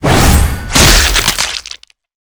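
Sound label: guardian scorpion attack, monster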